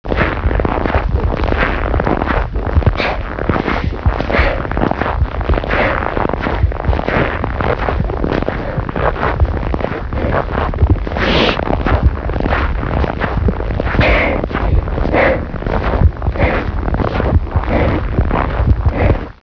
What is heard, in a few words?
walk